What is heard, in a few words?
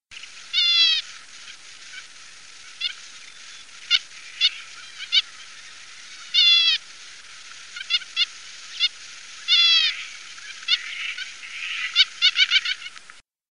finch double-barred, bird calls